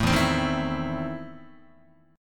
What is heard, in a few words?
E Major 7th Suspended 4th Sharp 5th, Guitar